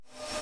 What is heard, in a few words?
Void Suck